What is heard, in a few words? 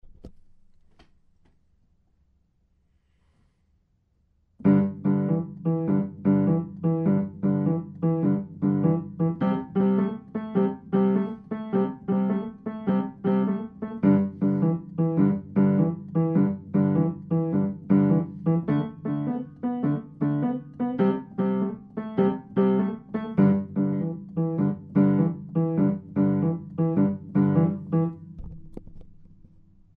Classic Bass